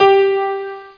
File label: grand piano G3; grand piano G4; Star Trek JR Audio